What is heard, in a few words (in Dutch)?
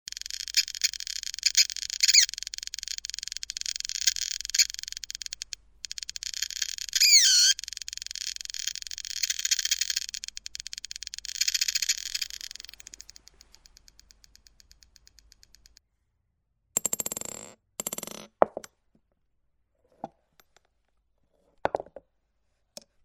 muziekdoos - 9v batterij